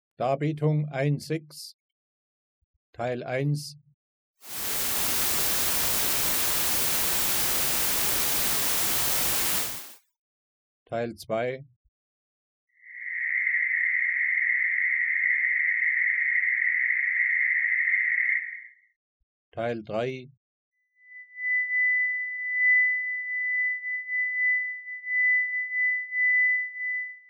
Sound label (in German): Schallarten, Teil I enthält Weißes Rauschen